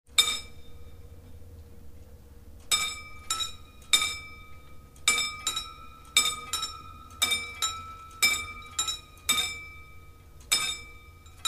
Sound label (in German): Schranke Klingelton